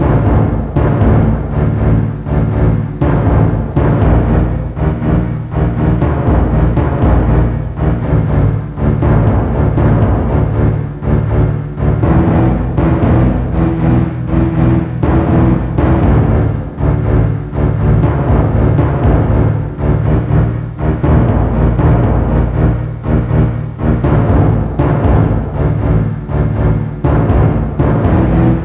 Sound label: battle